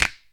clap sample c key; percussion; single hits one shots

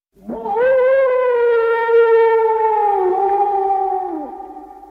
wolf howling; sound effect; animals

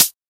studio pedal hi hat sample a key

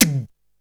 airy sizzle snare sound c sharp key

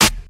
loud snare drum sample C sharp key; snare drum samples

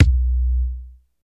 roomy kick single hit b key

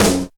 good snare drum f sharp key

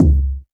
puffy steel kick drum sound c sharp key

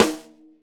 dope snare sound d sharp key; snare drum samples; one shots

